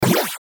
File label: button digital robotic; Multimedia; Flash, Animation, Digital, Beeps, Whoosh, Production Element, Imaging Element, Accent, Transition